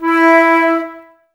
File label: Flute, Woodwind; Miroslav Vitous - Woodwind Brass Ensemble; 90's Sample CDs